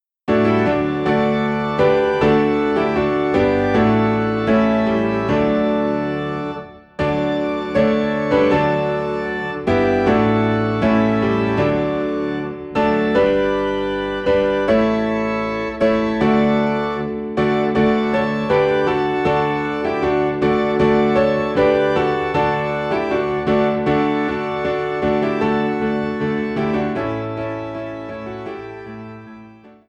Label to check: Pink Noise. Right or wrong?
Wrong